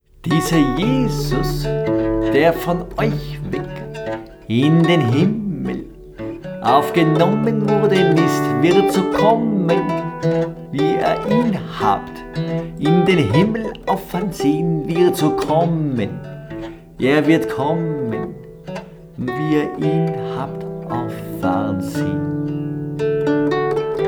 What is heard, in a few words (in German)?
Christliche Volksmusik Lied